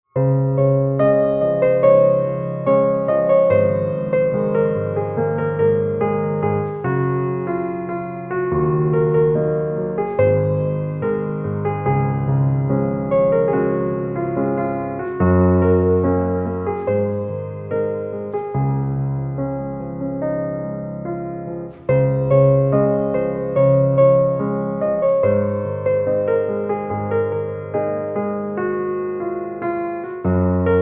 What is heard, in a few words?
Piano Silent